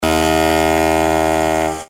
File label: Ship horn, SMS tones